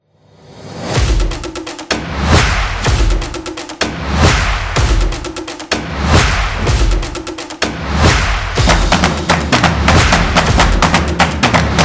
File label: stomps and claps; Web Site Promo